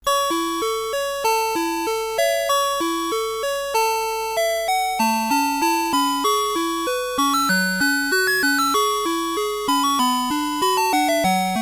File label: 8 Chord A